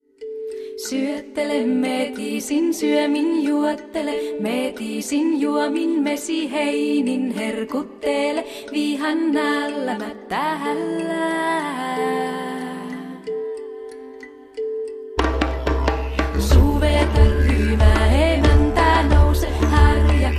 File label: gjallarhorn